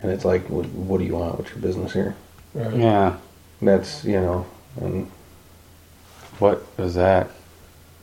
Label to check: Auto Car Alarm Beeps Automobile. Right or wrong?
Wrong